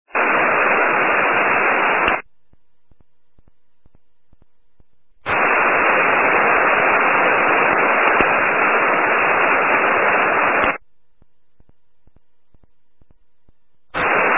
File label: test echo